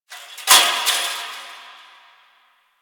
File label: Fence Rattle One Off; Warframe; Ambience; Nineteen Ninety Nine; DE